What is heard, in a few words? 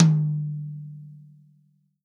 REAL DRUMS POP KIT